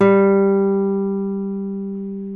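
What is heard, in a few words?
G2 CLASSIC, CLASSICAL GUITAR; Northstar - New Gold VOL-2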